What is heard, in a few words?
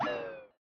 Level Down; Lethal Company